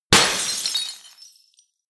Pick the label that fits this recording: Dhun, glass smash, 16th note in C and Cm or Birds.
glass smash